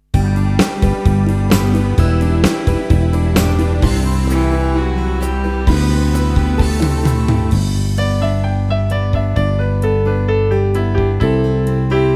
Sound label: Pop